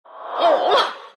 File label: pain; dota; death prophet